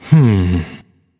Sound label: thinking; smite; hou yi